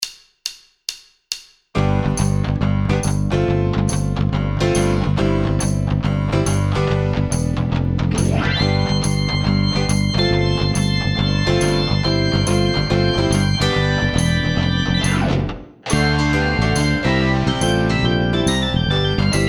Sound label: Backing Rock Shuffle